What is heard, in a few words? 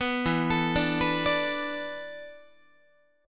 E 7th Suspended 4th, Guitar; E7sus4; arpeggiated; 14,12,12,12,15,13